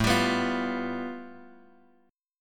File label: A 7th Suspended 2nd Sharp 5th, Bass Standard; A7sus2#5 strummed; JGuitar